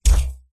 coin touch glitch robotic technology hi tech futuristic game tone sci; galactic force; science; ufo